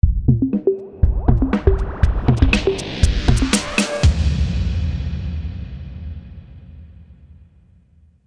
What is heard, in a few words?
KDE Startup